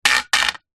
household broom plastic metal fall over bouce hard ground; zapsplat